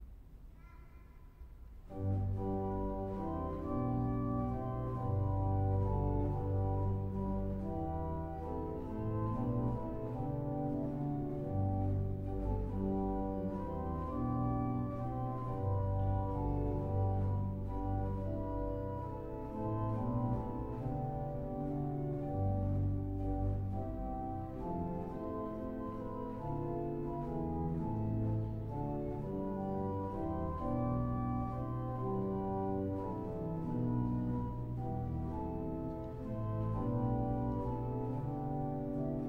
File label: Organ Recital, BWV663; Michel Bouvard; St-Sulpice